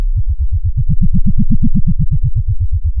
Sound of a plane taking off

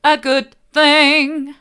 a good thing hard, vocal

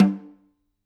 Snr Off, drum

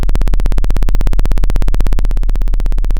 Sound of a plane taking off

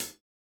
ClHat, drum

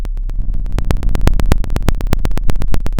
pop, Sound of a fire crackling

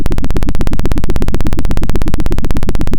Sound of a helicopter

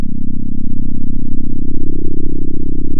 center, sound of a small motorcycle

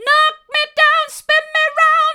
knock me down spin me round hard, vocal

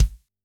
Kick, drum

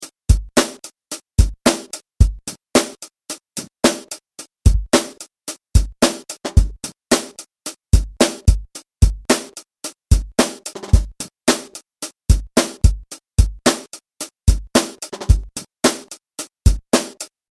kickin drum kit

